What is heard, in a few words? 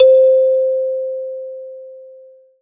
Roland SC-88 Kalimba C5